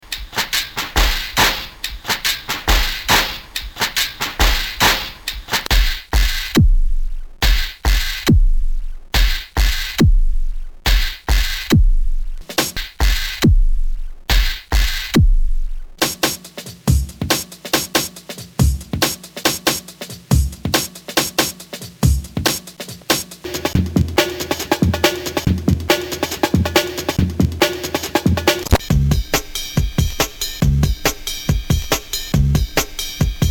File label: spl beats direct